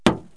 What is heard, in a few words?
Snowball Hitting Car Window; Outdoors & Nature; The Elements